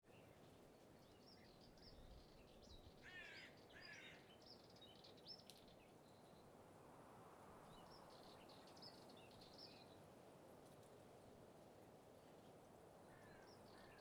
country birds crows flies roos; atmosphere

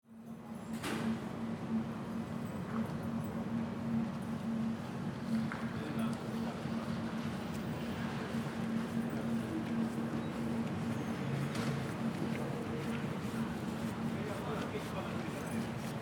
side street truck idle; atmosphere

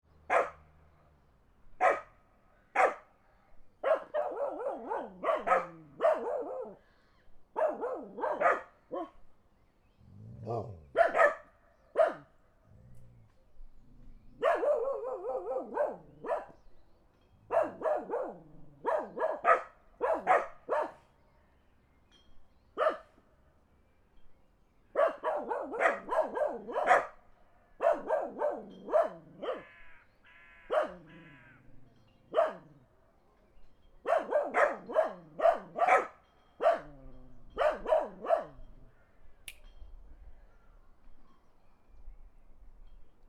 dogs bark country car pass; spoteffects

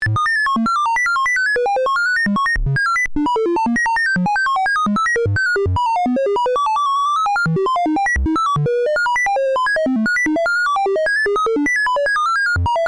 tri random old computer calculation

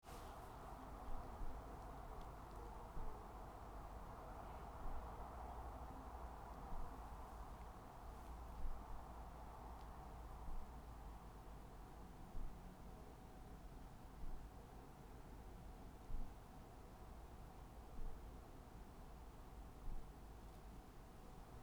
Atmo quiet, atmosphere

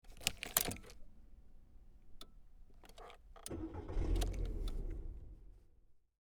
car start internal; spoteffects

